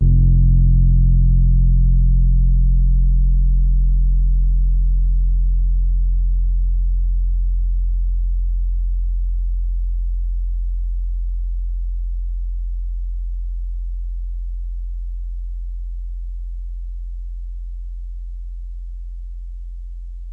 rhodes mark ii f 0; fender rhodes mark II

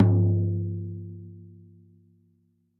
tom lo, gretsch kit

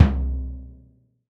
bassdrum, gretsch kit

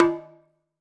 Udu Skin

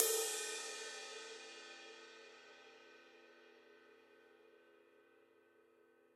ride, gretsch kit

